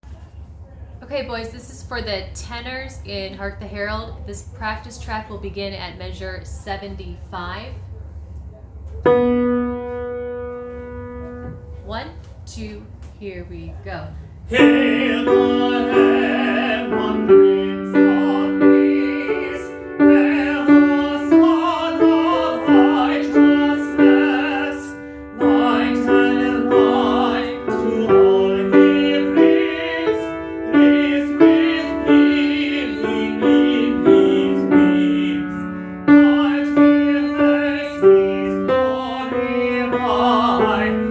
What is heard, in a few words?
tenor, youth choir; Hark the Herald